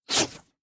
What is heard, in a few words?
spitter; factorio; Factorio 0.14.21; creatures